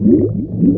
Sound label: swim below